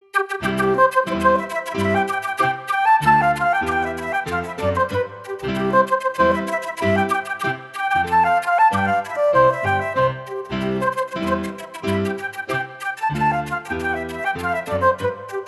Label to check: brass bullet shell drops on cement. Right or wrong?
Wrong